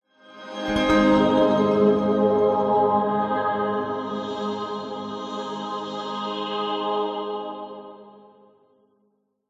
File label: musical heavely swell; zapsplat